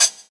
clean hi hat g key, Royality free high-hat sample tuned to the G note. Loudest frequency: 6169Hz; drum single hits one shots